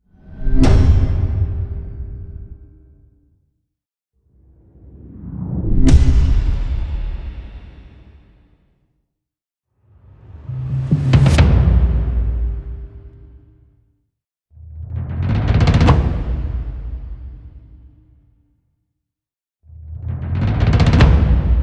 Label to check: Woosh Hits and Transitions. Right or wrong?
Right